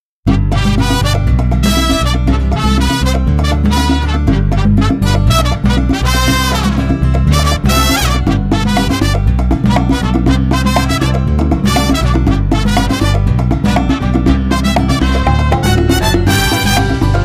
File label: Salsa Cha Cha; Latin Samples